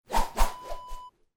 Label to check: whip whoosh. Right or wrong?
Right